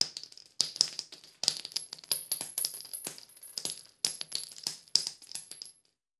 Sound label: Bullet Shell; Sound Ideas; Guns